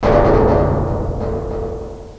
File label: metal; cstrike